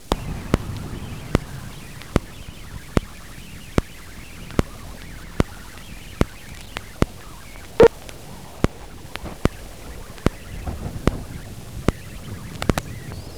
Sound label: Vostok mag; arctic antarctic